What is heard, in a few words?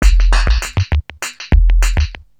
Industrial Beats